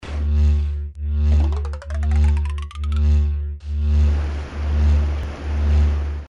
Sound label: magnet powerup active